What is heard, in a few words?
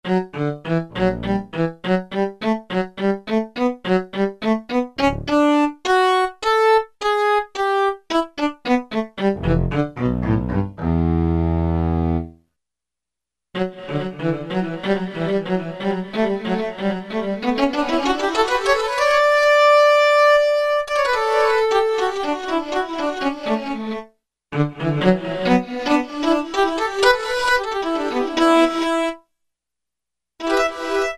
Multitap Reverse Pan, Alesis MidiVerb II